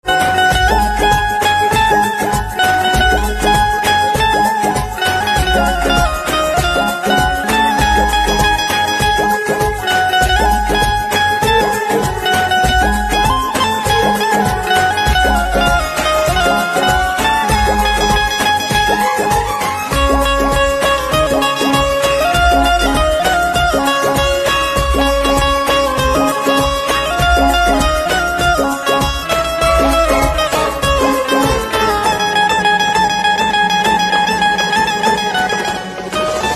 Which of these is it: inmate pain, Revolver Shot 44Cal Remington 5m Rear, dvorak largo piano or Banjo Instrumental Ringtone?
Banjo Instrumental Ringtone